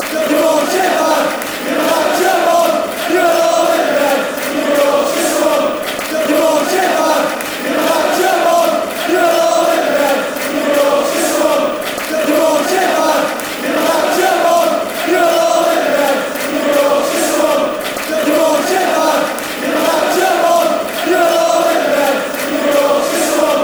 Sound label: interbred yorkshire scum, chants